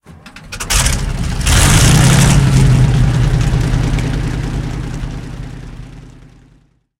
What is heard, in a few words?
panther engine start, engines tanks; War Thunder